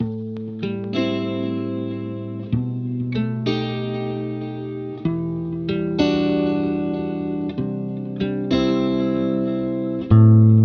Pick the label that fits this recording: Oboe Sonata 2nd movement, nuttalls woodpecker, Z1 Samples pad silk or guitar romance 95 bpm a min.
guitar romance 95 bpm a min